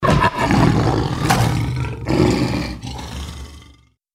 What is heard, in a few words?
deathclaw chase; slender